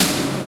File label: snare ambo; Roland L-CDX-01; Snares 6; Sn Modules 6